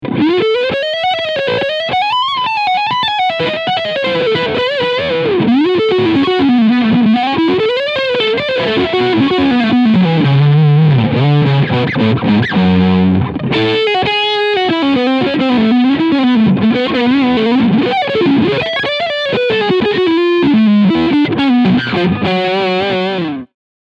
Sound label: Leadsound Front PU